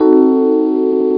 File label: EPiano Dm, Instrmnt; Sound Library 2000; Wayzata Technology